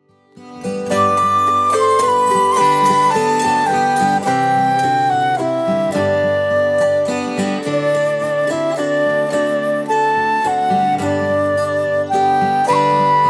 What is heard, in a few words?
autoharp